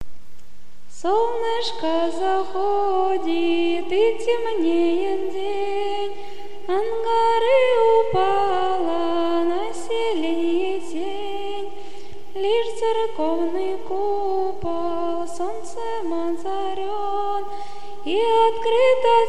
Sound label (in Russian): Духовный стих; Солнышко заходит